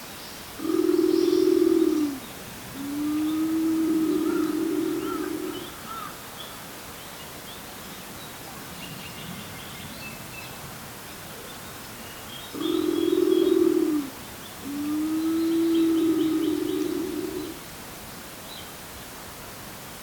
karasubato

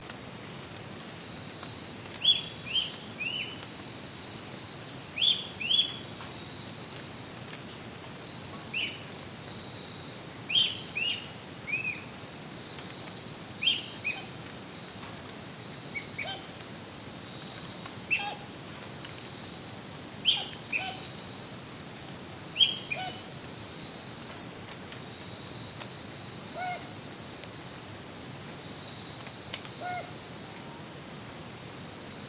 ohkonoha

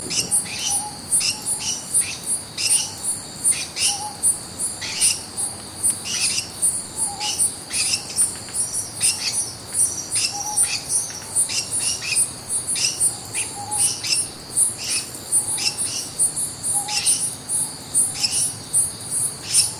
konoha

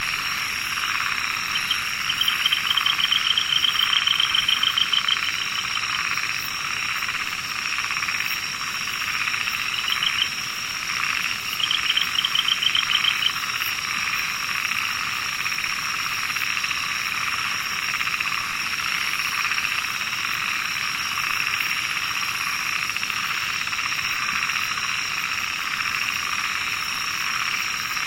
himeama

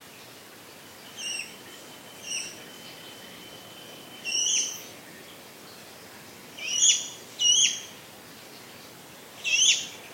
ohkonoha

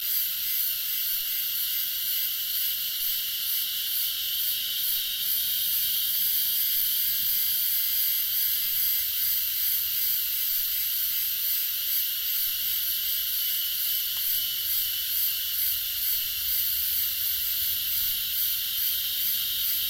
himeharuzemi

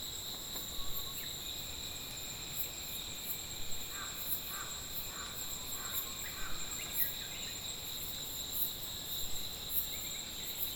noguchigera

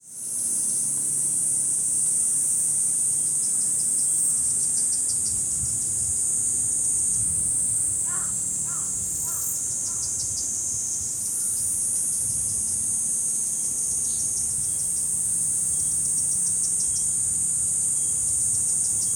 kuroiwazemi

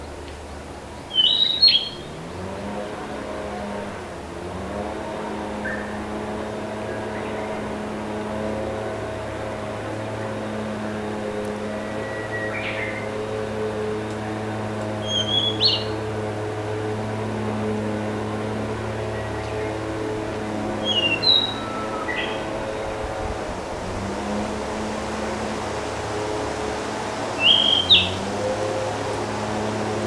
kibitaki

